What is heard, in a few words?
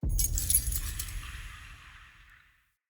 Key Pickup Crypt